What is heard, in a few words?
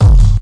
krock dist, Kicks; single hits